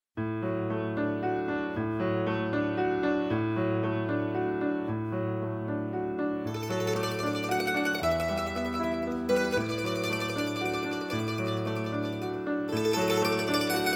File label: siciliano